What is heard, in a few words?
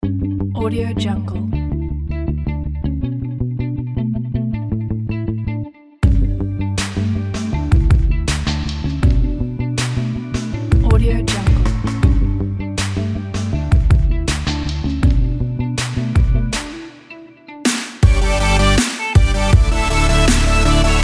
future pop